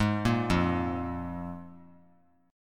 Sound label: F Minor Double Flat 5th; Fmbb5 arpeggiated; JGuitar